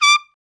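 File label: BRA TRUMP, trumpet, brass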